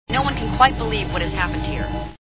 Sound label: scream